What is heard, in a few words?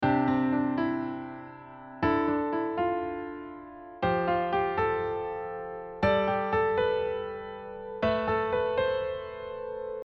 sus2 sus4